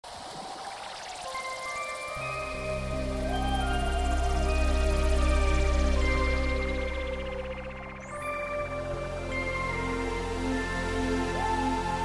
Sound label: atmosphere